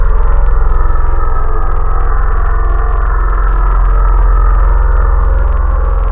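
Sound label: Vocal Digital Choir, wavetable; Synthetic's Serum Collection; Echo Sound Works Core Tables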